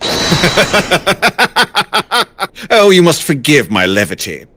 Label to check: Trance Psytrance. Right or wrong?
Wrong